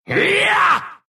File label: Monkey attack big, ru; Dota 2